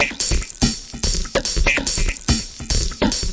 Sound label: dirty reaktor; SYNTH AND ELECTRONIC LOOPS